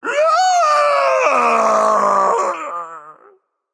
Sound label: fear, voicepack; smite; jorm